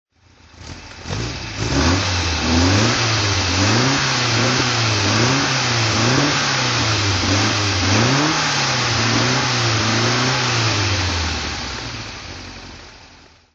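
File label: alfa romeo giulietta ss throttle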